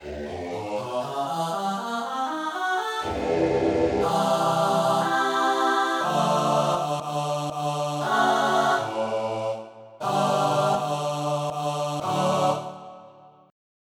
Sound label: Dry Ahhs, Voice; SoundFont - Traditional, Voice & Keys - Volume 2